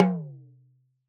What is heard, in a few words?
Talking Drum; World Percussion; Cymatics; Emperor World Percussion